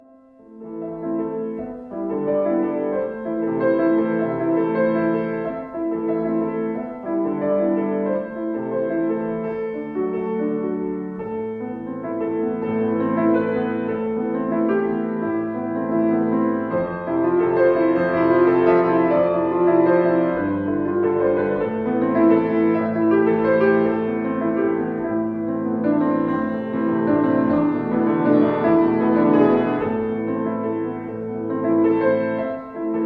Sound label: piano